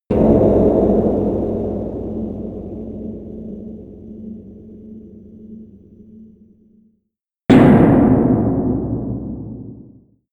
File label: airy cave hits